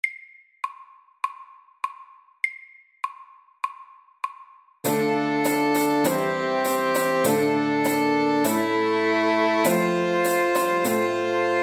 Old English dance MIDDELS

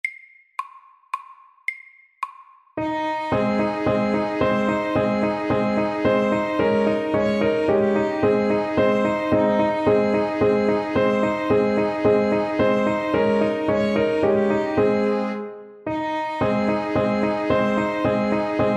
German dance MIDDELS

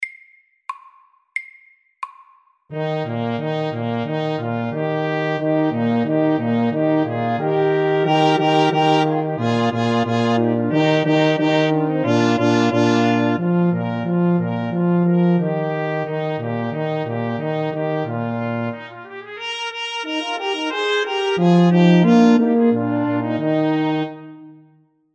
Fanfare FORT

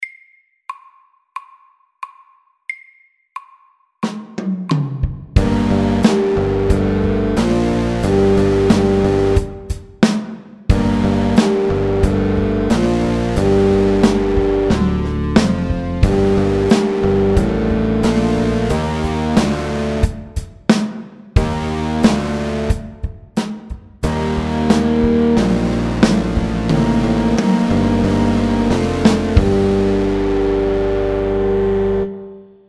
Metal rock SAKTE

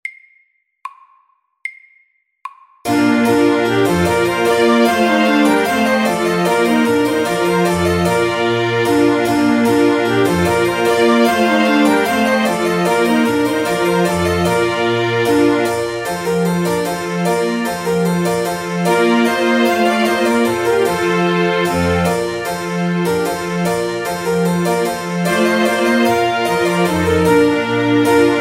Trumpet tune FORT

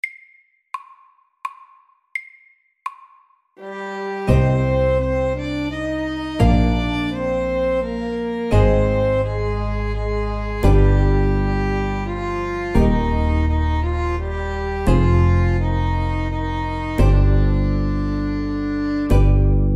Folketone MIDDELS

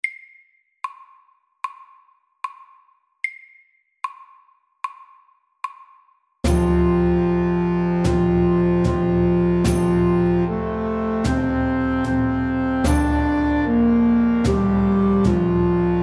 Engelsk melodi SAKTE

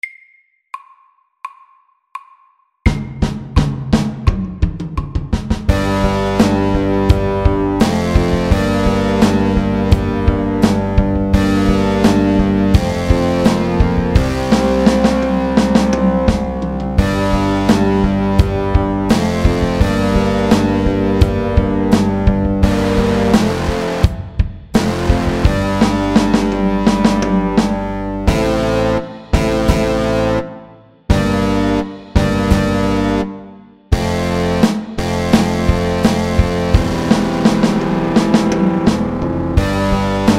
Heavy metal SAKTE

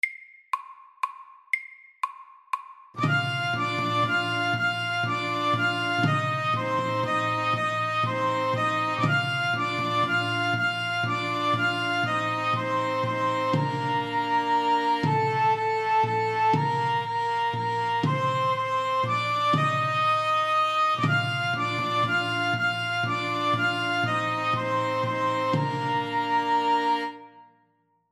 Irsk melodi MEDIUM

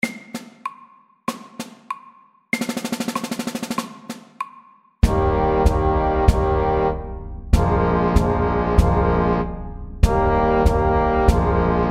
Minimarsj MEDIUM 96

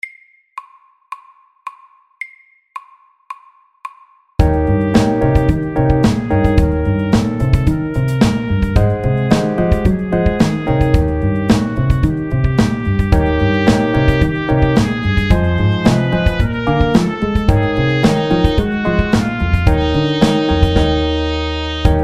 Chromatic blues FORT

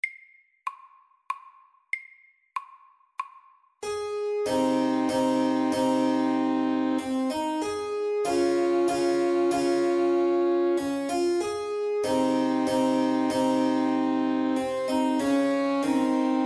Menuett SLOW

